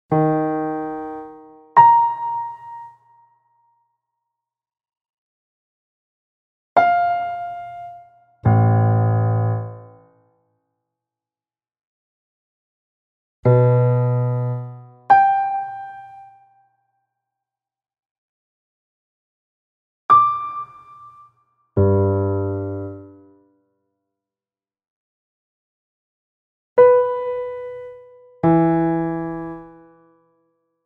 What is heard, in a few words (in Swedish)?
Vilken ton är ljusast Stora intervall